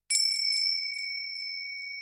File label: Cute Wind Chime Sms